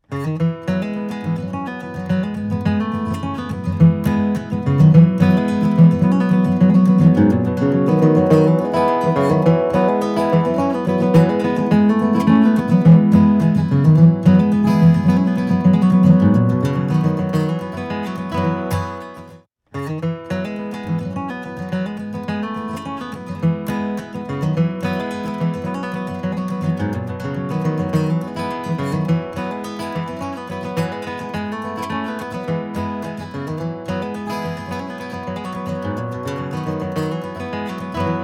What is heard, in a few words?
guitar finding EQ, EQ a guitar to clean up mud and improve clarity; Mixing for God audio examples